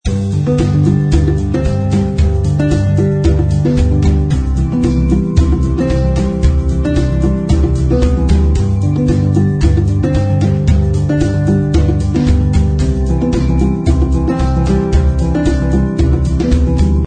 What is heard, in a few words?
Chorus No Piano; 夏日温馨套装 Warm Summer Kit